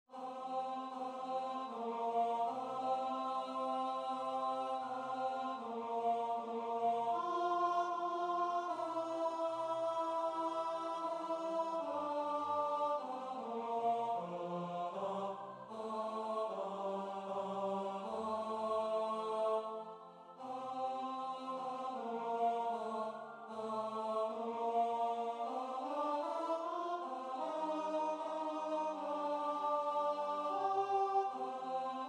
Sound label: tenor; The Silver Swan